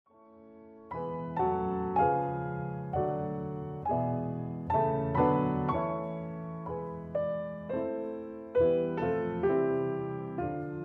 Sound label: Piano; Beethoven Waldstein Satz 1